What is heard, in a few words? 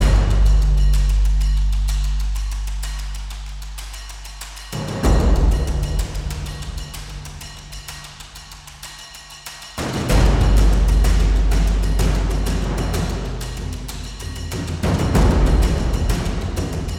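Action Orch Tech Loop; Call of Duty Modern Warfare 3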